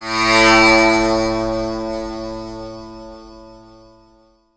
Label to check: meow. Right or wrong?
Wrong